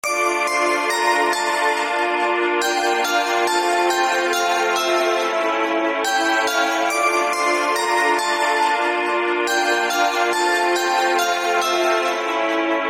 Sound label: Dubstep